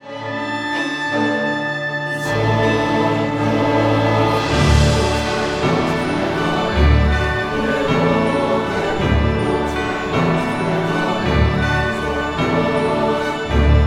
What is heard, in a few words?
Musical Symphonic